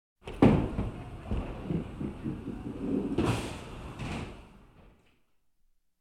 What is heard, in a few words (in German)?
Sport Alle Neune